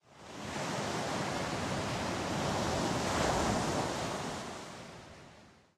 Amb Beach Waves

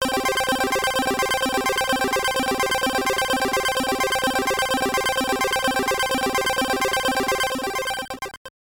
Shield Bonus; Supercross Freestyle 2000; GBC

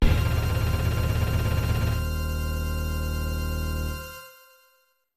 Match Over; Mortal Kombat II; Match Over (Shang Tsung)

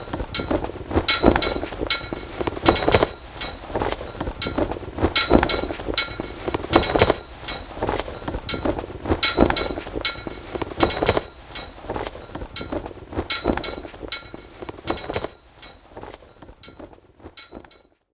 Amb Generic; Dirt 4

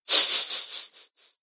Beaver Laugh

